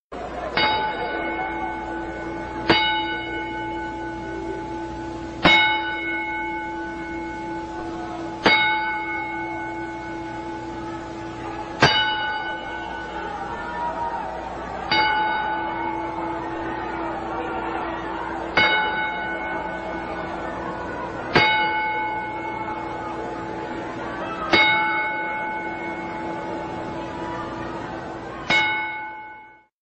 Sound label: bell salute